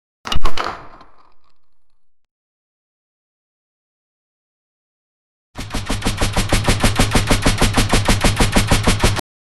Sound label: punching sound